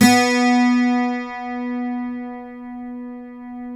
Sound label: 12 string b2, guitar